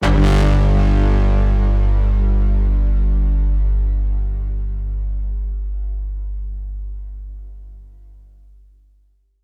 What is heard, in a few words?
Braam (G), Effective Trailer Braams; Federico Soler Fernandez